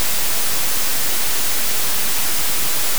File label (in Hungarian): feherzaj